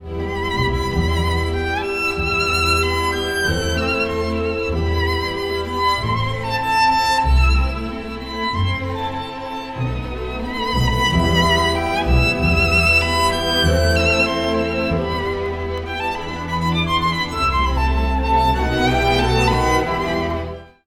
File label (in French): Concerto pour violon 1er mvt; Mendelssohn